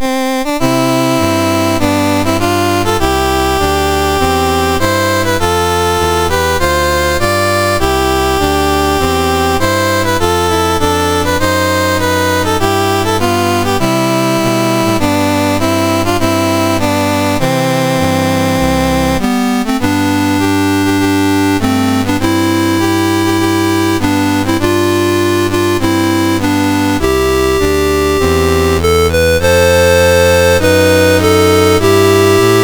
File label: Penang, National Anthems; MOS6581R2; Dave Marquis